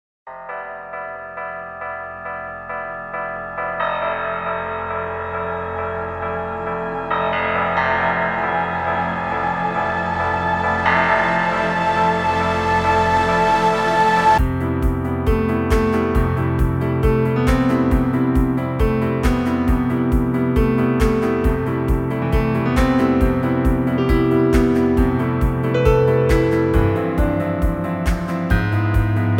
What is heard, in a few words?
Waves